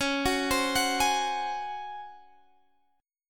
C sharp Major 7th Suspended 4th C sharp Guitar Drop D